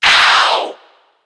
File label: Serato Scratch Sample Oww